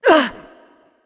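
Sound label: grunt hit, female; smite; voicepack; hera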